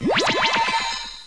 transition; Professor Fernleaf from the Tropics

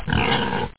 pig; PC Gamer IT CD 31; DINK

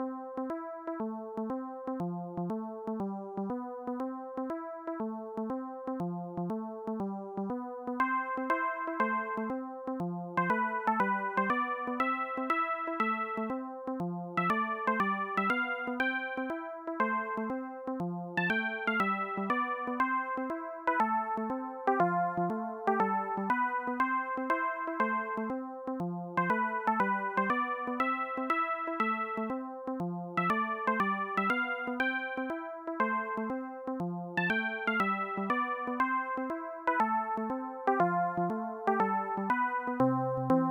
tinkle

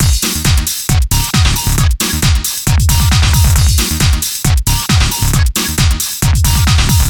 groovebox

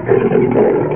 Growl; Realmz

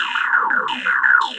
rez beat; Shogo

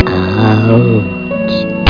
ouch

